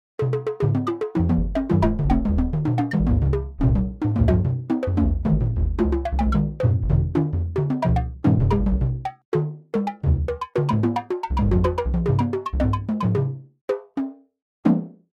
indian percussion ghatam ghata

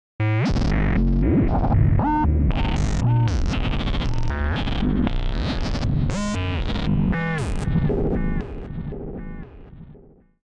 lfo frequency automation

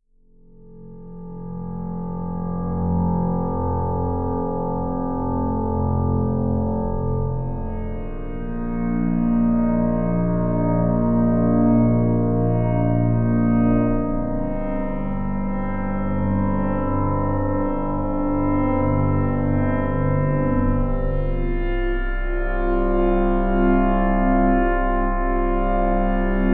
drone piano drone

